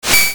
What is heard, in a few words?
retro; maoudamashii